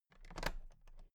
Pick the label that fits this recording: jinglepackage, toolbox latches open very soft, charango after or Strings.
toolbox latches open very soft